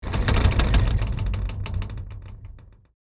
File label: Metal blind frame shake rattle; blinds